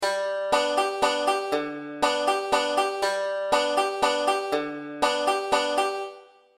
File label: 3 4 roll, banjo; beginning 5 string